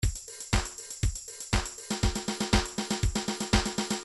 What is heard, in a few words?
disco 2bar